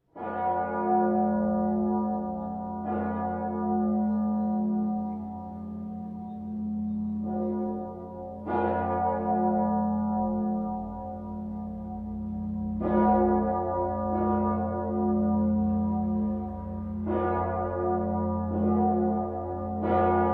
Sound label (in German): Glocke fis, Domglocken; Kardinal